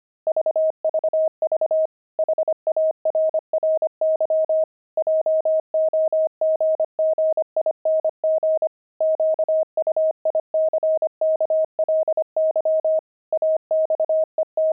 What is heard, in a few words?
pangram, intermediate pangrams files